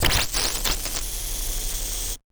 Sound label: Casio, Glitched, malfunctioning synth